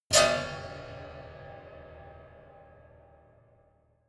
piano squeal

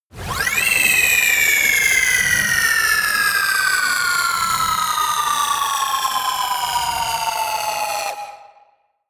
glitchy space drop

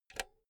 insert cinch

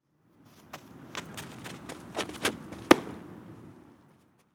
ball flying ball catch

